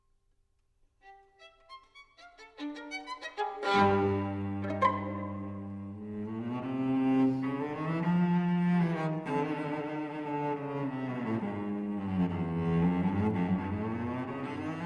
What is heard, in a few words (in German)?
Schwarzer Walzer